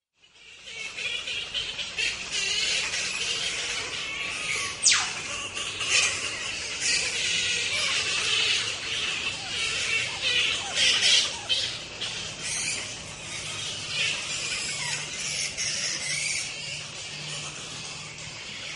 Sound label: Bellingen Midday Soundscape